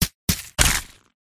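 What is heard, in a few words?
manticore attack contact middle; mantikora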